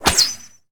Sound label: bow shoot crit; minetest; x bows